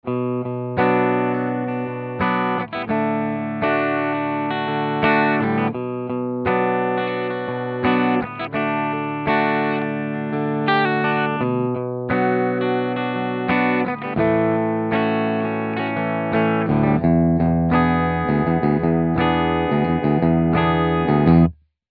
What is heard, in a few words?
CLEAN